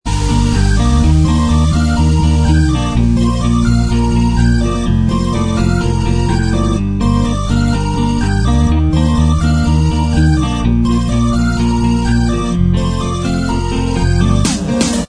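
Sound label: instrumental, backing track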